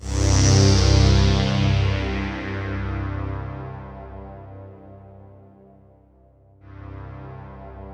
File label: POLY SAW, Roland JV 2080, C1